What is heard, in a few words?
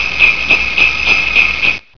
jingle bell